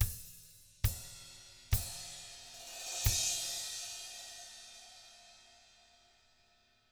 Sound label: Double Platinum Drums, County